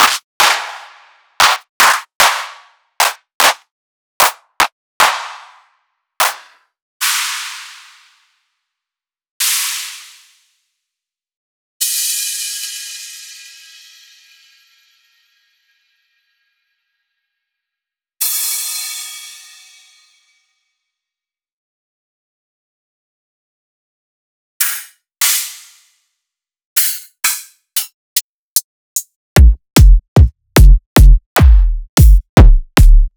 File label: Drum Hits